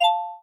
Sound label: correct letter